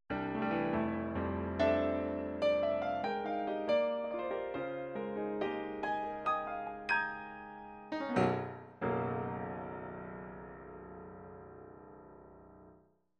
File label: Roland Fantom XR Piano